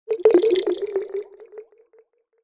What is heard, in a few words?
sound design liquid dripping bubbles; sound effects one